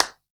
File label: clap; CLAP-HIP; Best Service; Dance Mega Drums